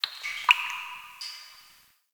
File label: Drip, Ambience; Warframe; The New War; Zariman